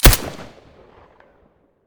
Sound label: silencer colt1911 stereo